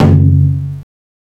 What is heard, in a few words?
soft hit whistle